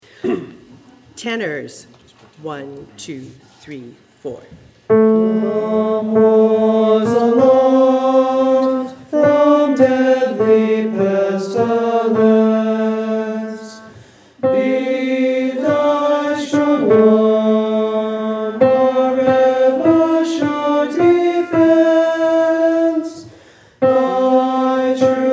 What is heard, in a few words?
TENOR, Choir; god of our fathers